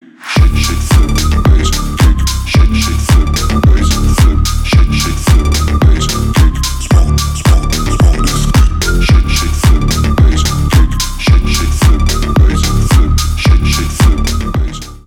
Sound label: bass flip; csgo; tecnohard